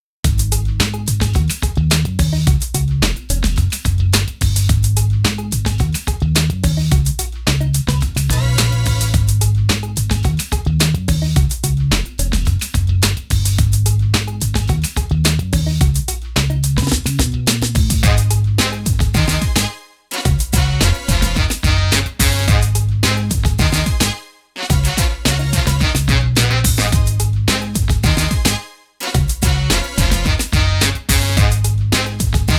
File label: hiphop